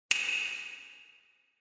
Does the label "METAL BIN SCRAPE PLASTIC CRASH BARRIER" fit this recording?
No